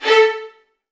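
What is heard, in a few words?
VN FX A2 right; ILIO; Kirk Hunter Virtuoso Strings VOL-1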